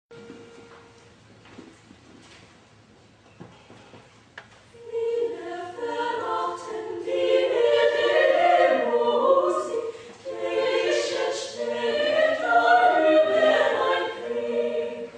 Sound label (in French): Choeur